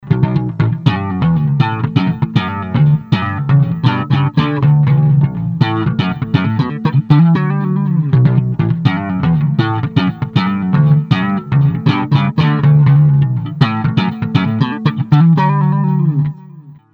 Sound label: bass a mixolydian